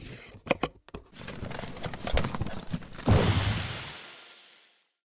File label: medikit; healing